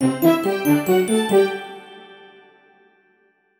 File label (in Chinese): kai bao xiang